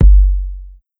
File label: Kick Dirty South Kick